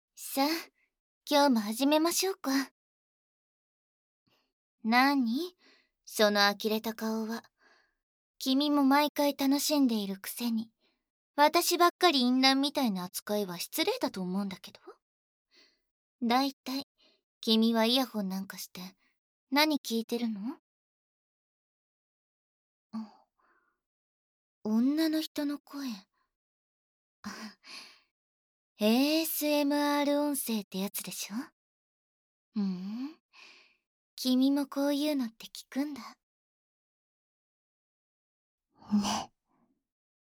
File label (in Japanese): 耳元囁き＆耳舐め; ボッチJK; SEなし